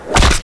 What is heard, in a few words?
tentacles hit